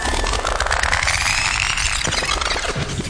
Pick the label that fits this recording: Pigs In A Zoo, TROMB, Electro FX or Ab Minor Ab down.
Electro FX